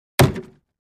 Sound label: Plastic Car Door Hit